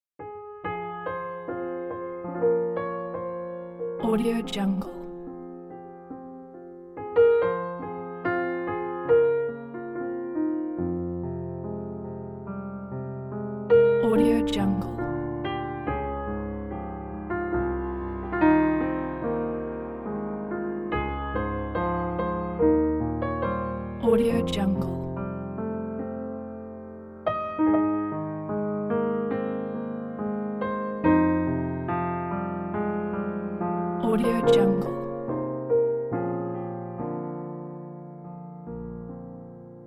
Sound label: o come emmanuel piano